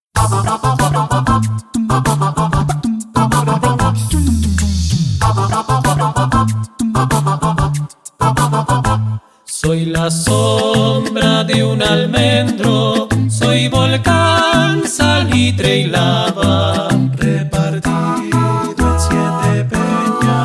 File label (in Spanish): himno canarias vocal